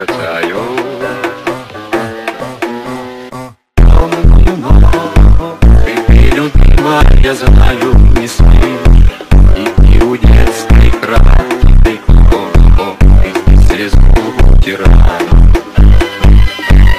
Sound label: phonk